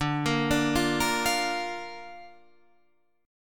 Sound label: D Minor Sharp 5th D down Guitar Standard; Dm#5 arpeggiated; JGuitar